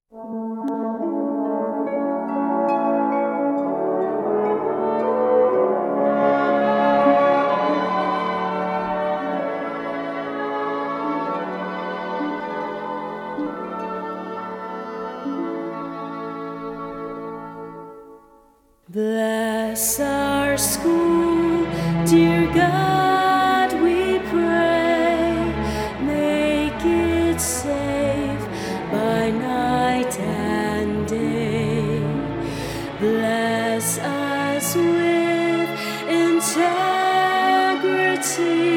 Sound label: School Hymn Vocals; GTIS